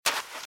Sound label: Footsteps - Sand - Dry - Run - Right